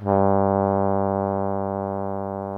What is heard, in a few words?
BRASS 2 G1, ORCH BRASS; Greytsounds VOL-1